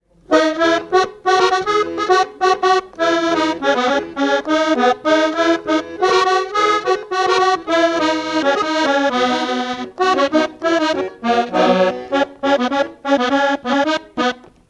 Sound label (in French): polka ancienne